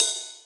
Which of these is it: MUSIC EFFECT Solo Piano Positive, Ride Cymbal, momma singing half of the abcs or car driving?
Ride Cymbal